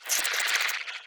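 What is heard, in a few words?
Teleporter Stealth Attack Grapple; Evolve; Wraith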